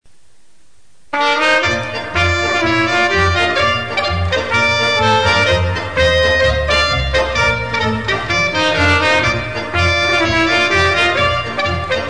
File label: polka